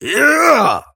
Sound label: effort melee big; Operative